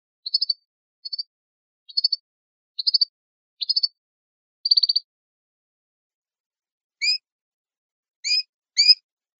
penalizaciones pirreos piadas, campero

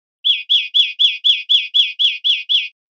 golpes corridos, verderon

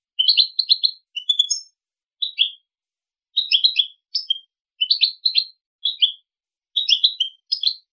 toques bibleo, campero

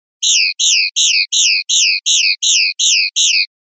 rep sonajas

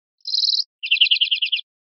camacholada, verderon

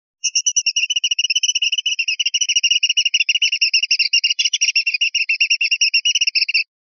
rep bailoteos, pardillo

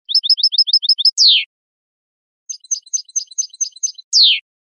bibleos tableteos rematados

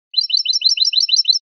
bibleo martilleado

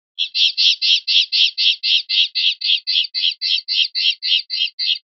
jases, pardillo

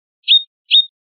pinzonada, pardillo

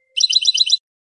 bibleos simples, campero

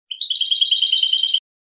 cascabeleo fino; campero

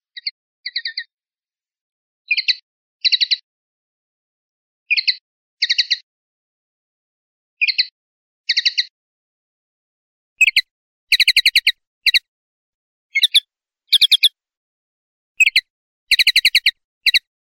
adornos toques de tateo; pardillo

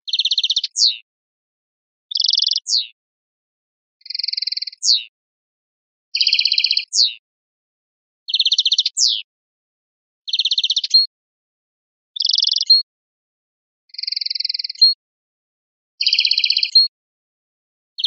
repertorio torreada; campero

